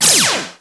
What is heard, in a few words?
fx gun, Yamaha Motif ES; electronic & zap; Yamaha Motif ES-Series